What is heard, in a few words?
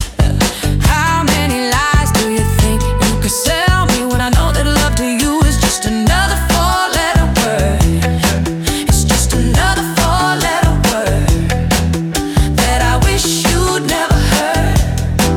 Funky Rock Soul; Humain Records